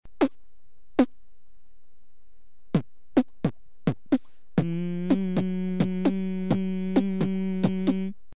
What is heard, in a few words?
snare